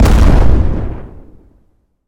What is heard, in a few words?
Explosion Large; JASEM: Just Another Shooter with Electronic Music